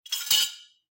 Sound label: Silverware Foley; SoundsCrate